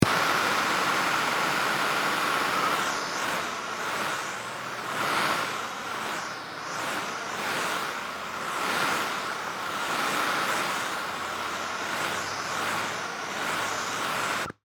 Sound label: Propane Hand Torch; Machinery; Machines